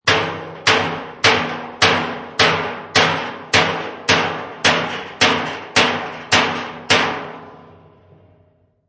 Hammer Bodywork Slow, Car bodywork being hammered, slowly, several metal hits, rattling; Car bodywork being hammered, slowly, several metal hits, rattling and very resonant.; Hammers